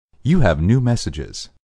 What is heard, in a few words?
New Messages